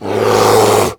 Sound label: Grizzly Attack